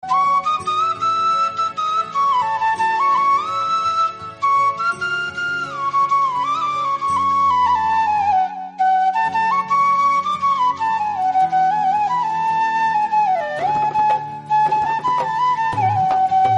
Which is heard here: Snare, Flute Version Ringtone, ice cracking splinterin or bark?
Flute Version Ringtone